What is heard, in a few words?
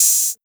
Open Hat Brighter, 808; 808 Open Hats; Slate; Vintage Drum Machines Volume 2 808 Edition